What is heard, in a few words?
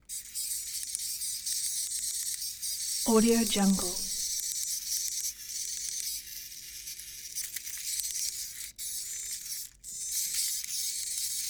servo robot mini toy two leg spinner; soundmorph